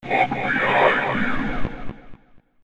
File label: look behind